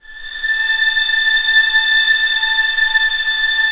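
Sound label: STR A5 PP, Orchestra Strings; Sound On Sound - Peter Siedleczek's Orchestra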